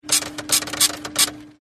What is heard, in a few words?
printer winner